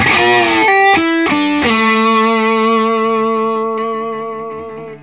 SOLO E, Vintage Blues Guitar

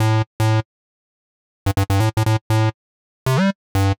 Synth Bass A; PowerFX - Nu Jazz House

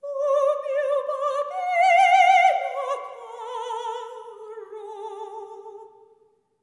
SOP. TRAD, Symphony of Voices; Spectrasonics

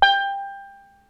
steel drum A3; ETHNIC DRUMS; Crypton - DrumBase5000

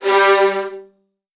G1, VN 16TH, Virtuoso Strings; ILIO; Kirk Hunter

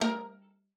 A#4, VIOLA FINGER; Xsample VOL-5

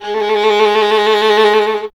STR VLN, Solo Instruments; Miroslav Vitous

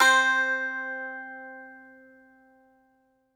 BAGLAMA, Plucked Strings

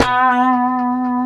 B 3C, COMPR STRAT, Guitars; Spectrasonics; Hans Zimmer Guitars VOL-1; 90's Sample CDs

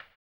HAMBONE, Vocal Blues Soul

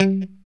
POP G 3, STRAT guitar; Spectrasonics; Hans Zimmer Guitars VOL-1; JC-STRAT POP

